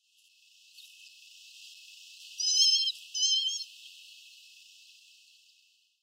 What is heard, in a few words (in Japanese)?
tageri, hyogo